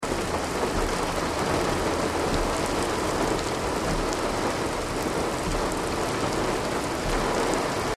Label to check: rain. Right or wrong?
Right